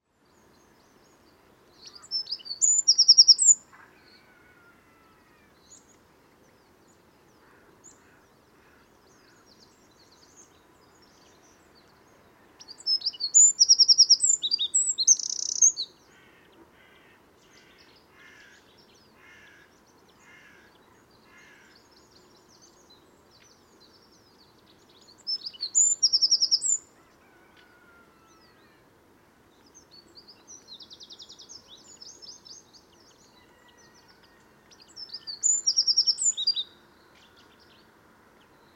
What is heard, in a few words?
Troglodytes troglodytes; SETE